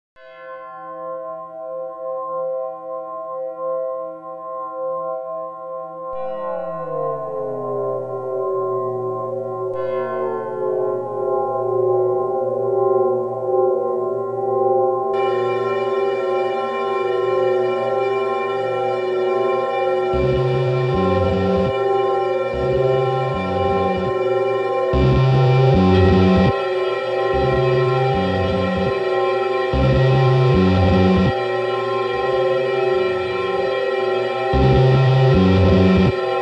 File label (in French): exemple bells; physical music; time freezer